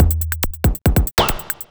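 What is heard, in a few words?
DRUMLOOP ELECTRO 140; Techno2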